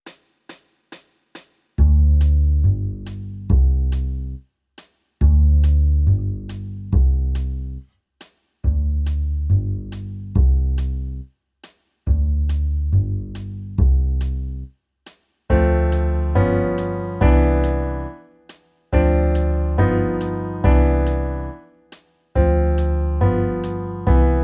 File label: Jazz backing